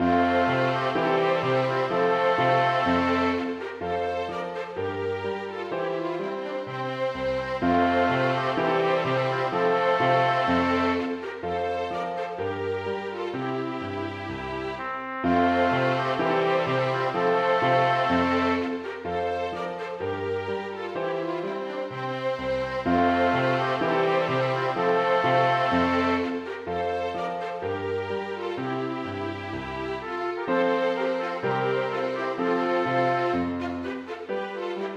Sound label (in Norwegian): for trompet, to fioliner og piano; Bang; Marchs de Brandenburg